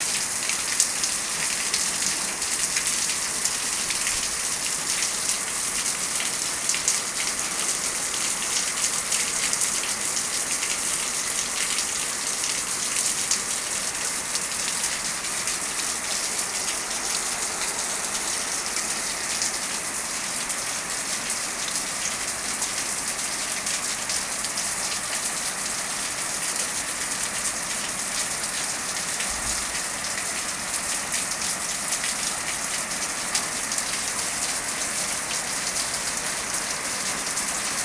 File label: outdoor